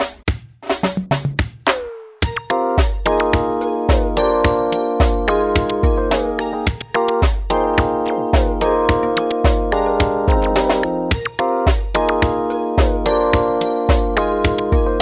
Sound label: BGM Menu; Acrylic Palette Irodori Cafe Cheers; 3DS